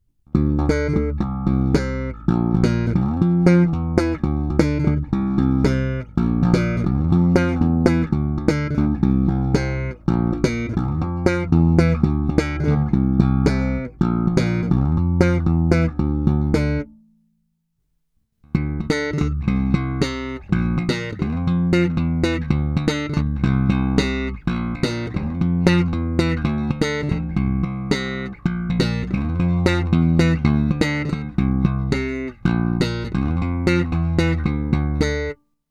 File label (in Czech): Fender Blacktop Precision Bass, Slap na oba snímače (nejdříve HB, pak SC); Nejvíce se mi líbí zvuk na oba snímače